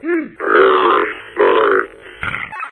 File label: zombine idle; npc; source engine; episodic